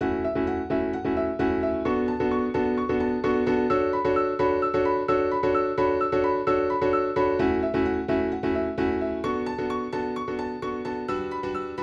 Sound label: build piano; 130BPM